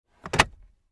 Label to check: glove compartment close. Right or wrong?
Right